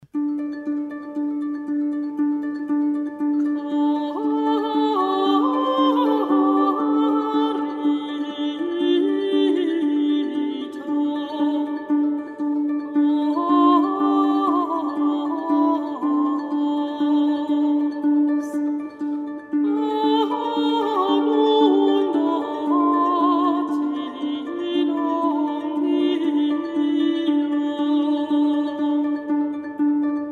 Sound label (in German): Mezzosopran Harfe